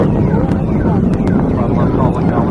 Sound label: Space Shuttle launch